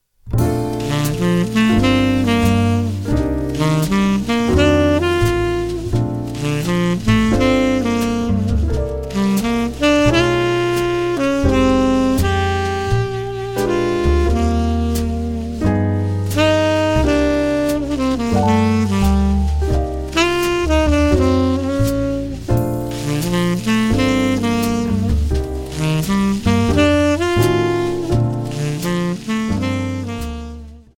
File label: sax phrasing tenor; Fargo Avenue